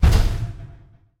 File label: walk, irongolem; mob; Minecraft; Votive World